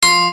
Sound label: bonus chance; Casanova